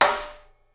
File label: SNARE, Live Snares